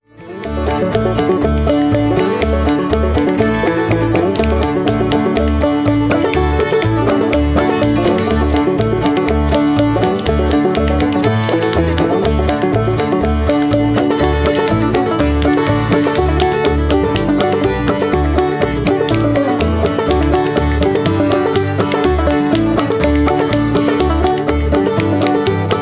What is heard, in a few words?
banjo